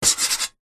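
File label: office pen scribble signature on metal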